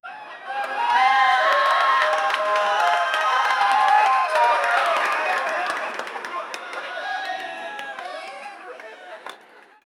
a party going on in

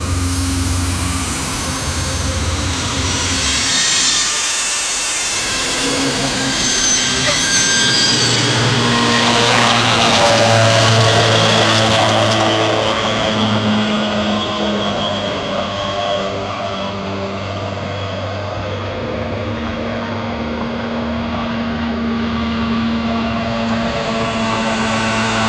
airplane is crash and; disasters

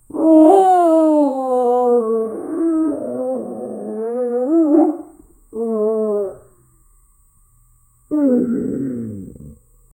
monsters warring and peop

